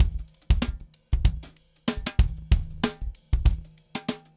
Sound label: funk rods 95; odd loops